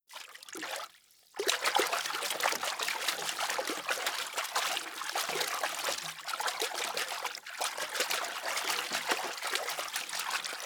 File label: Water Movement; Blackmagic Fairlight